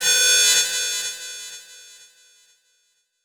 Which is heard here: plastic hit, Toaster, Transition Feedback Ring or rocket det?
Transition Feedback Ring